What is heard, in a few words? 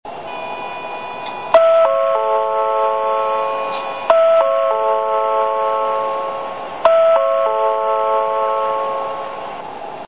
bus chime; 福島交通; チャイム３は郡山支社の高速バスで扉が開くと流れます。